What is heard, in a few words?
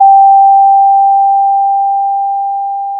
pop, Sound of a school bell